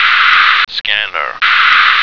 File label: scanner